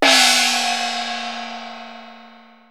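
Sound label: small gong, music comedy; KABOOM! - Wild and Wacky Sound Software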